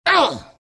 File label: pain; rattletrap